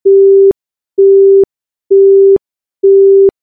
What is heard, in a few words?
Telephone; Rei Yumesaki